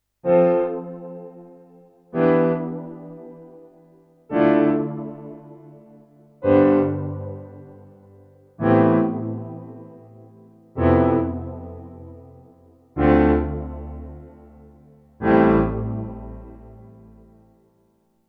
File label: Roland RD 08 Sky Piano